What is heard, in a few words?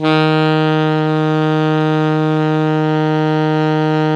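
ALTO pianissimo D#2, Sax; Giga Samples Collection; ALTO SAX PP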